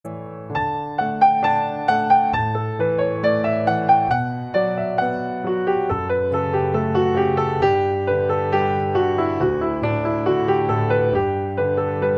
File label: canon in d piano